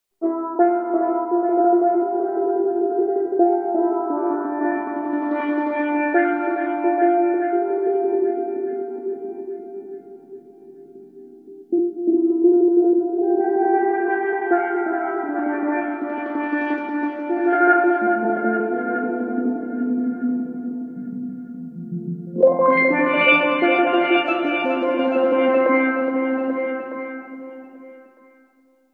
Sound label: steel dub